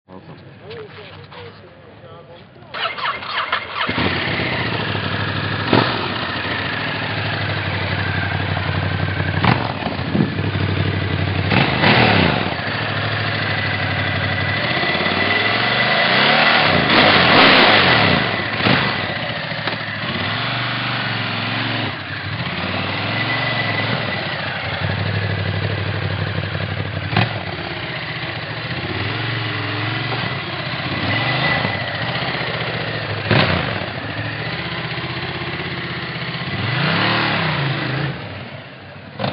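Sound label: Ducati M1200S, motorcycle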